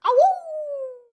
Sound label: flea howl; silksong; raw